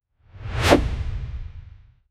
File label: short whoosh transition